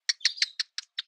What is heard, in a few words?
Psittacula Krameri Single Call, Birds; A Handful Of Birds; Sonic Bat